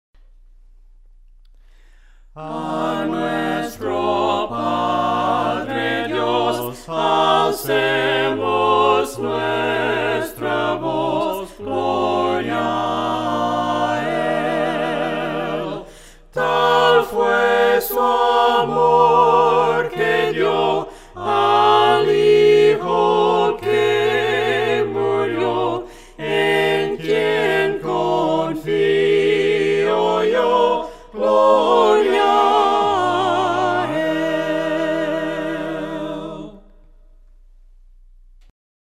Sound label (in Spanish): GLORIA A LA TRINIDAD VOCES, himnos a capella; cantos del camino